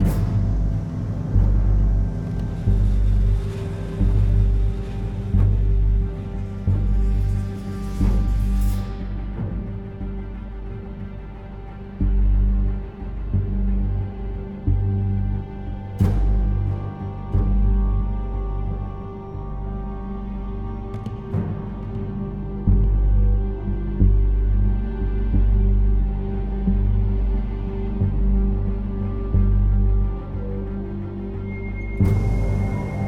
loop Stealth; the walking dead michonne 2 give no shelter

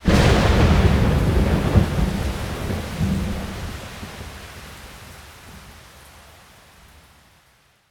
environment lightning

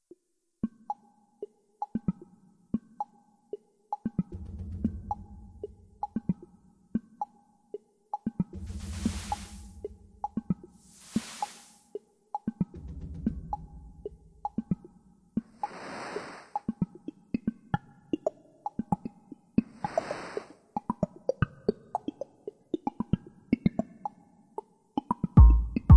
Cave Birds; junk jack mobile

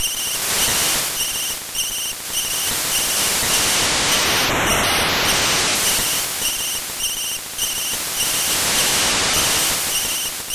Ambient - Night; gamerip; shovel knight king of cards

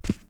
Walk; Dumbledore

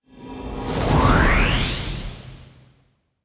whiteout

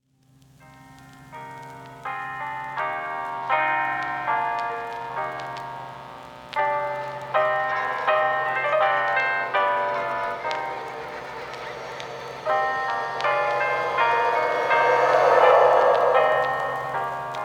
Matthäus-Passion; bayonetta 2; Angelic Hymns Gold LP

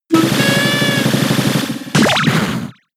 Disarming Voice; Pokemon; gen 6; XY; ORAS

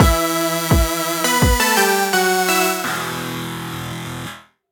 swamp fight end; Fight Knight

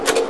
Projector Slide

